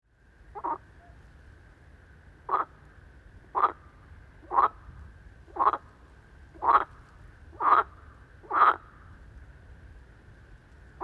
Toads and frogs calling at night

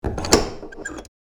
Squeaky wooden door latch locking; household

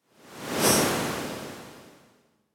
Smooth high frequency whoosh; transition

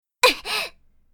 Pain grunt hit anime girl vocalization

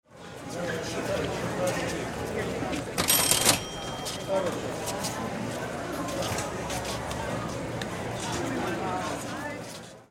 Market ambience cash register

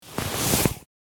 Large full bag dragging noise; foley

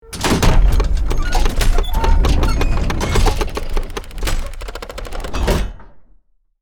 Big cinematic gears movements

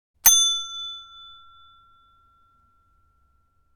Desk bell single ring with fadeout

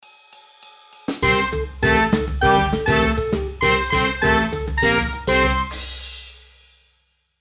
Classic ad break transition sitcom music

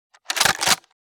Assault rifle foley manual reload mechanism

